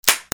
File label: FRICTION